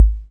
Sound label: ace bd, Ace Tone Rhythm Ace FR-1 drum machine